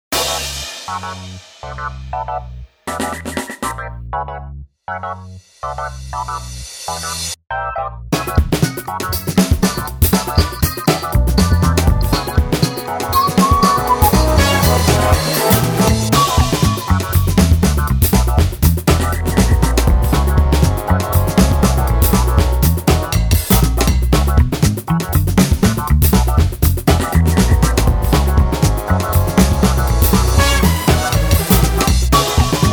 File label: Latin Tap